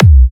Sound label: Kicks B; markus hakala